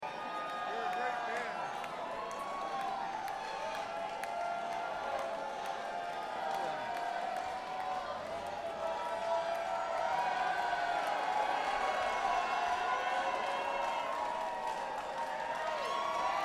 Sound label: Crowd